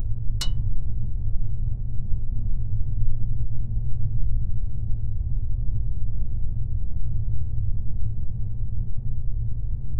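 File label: metal click of oxygen tank; rustle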